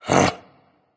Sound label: sigh berserk; cuchulainn